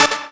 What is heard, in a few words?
Stab Synth A; Ableton Live 12 Lite; Core Library; Zambia